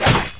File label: player hit; Battle